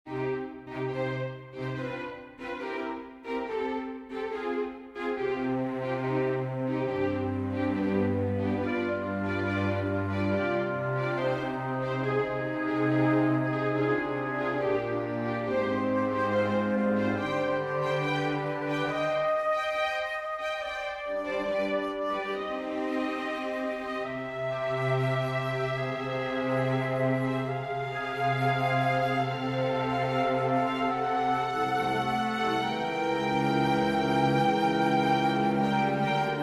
winds strings; Doleful tidings how sound; Deborah

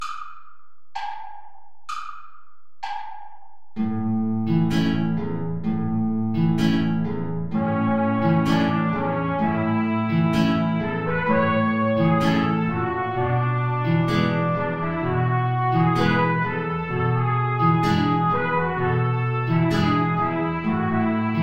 tango play along without trumpet; albeniz isaac

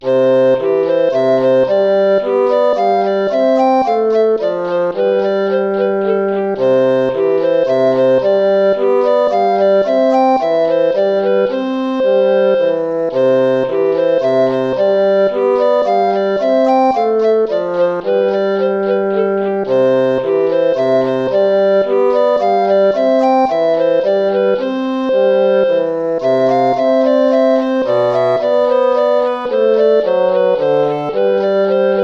tempo minuetto; hook james

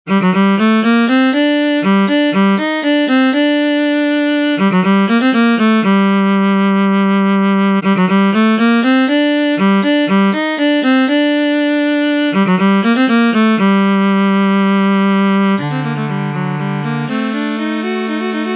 string trio in g minor; bogosh noah; string trio no 1

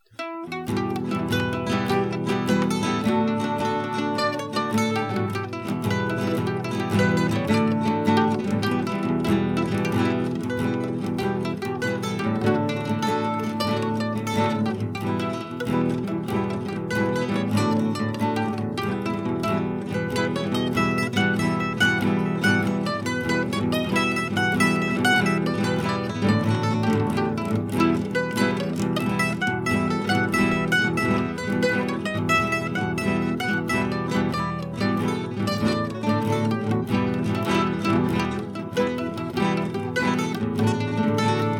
jig

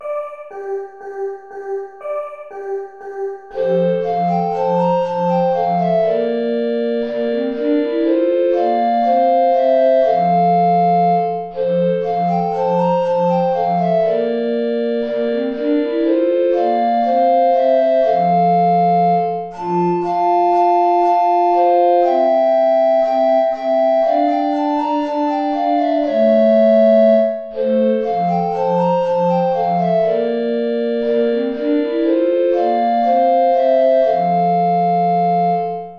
play along without tenor recorder; steuerlein johann entends une chanson

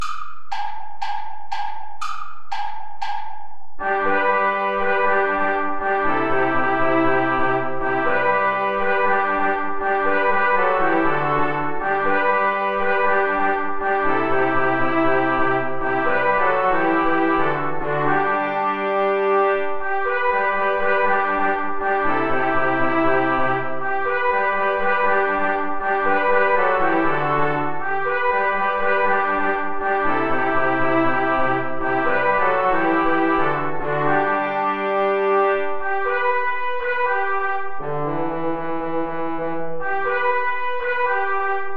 ipharadisi play along without trombone; traditional

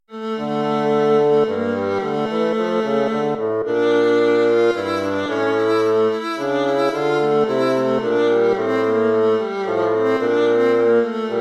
courante

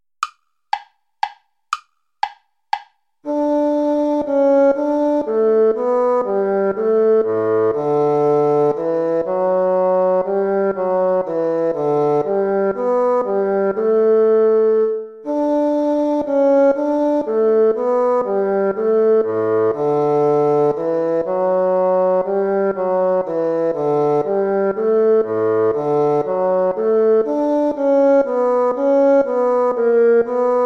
minuet play along

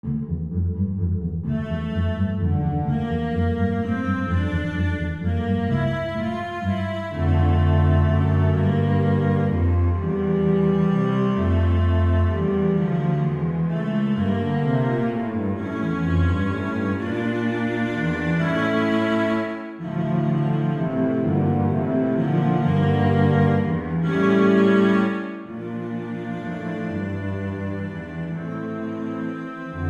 cello duet; monte abraa

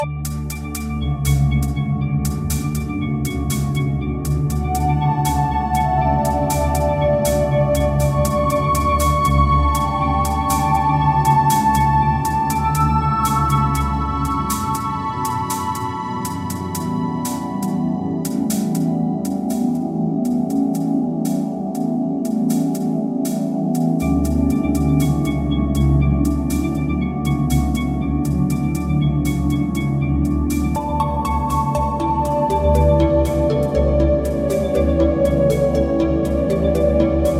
deep trance mix

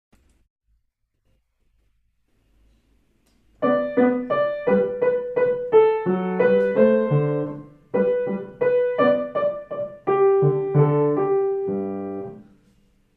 moderato menuet

